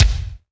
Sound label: MIX BD, AKAI S6000, DRUM RYTHM